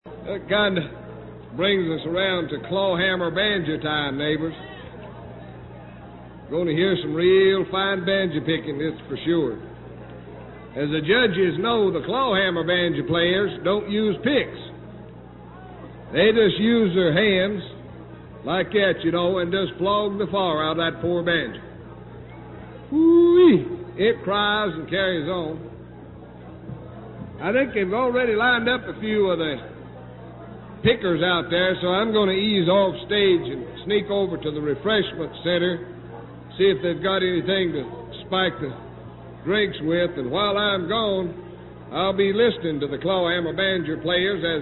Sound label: intro for clawhammer banjo